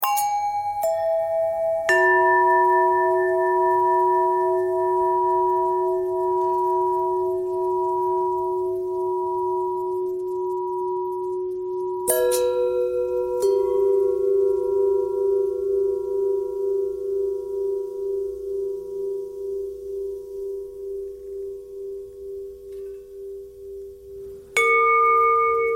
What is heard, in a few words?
bell long bell thread pentatonic